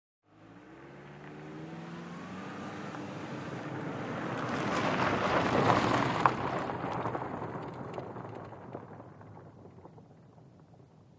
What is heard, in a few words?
Sedan Pavmt Grvl Med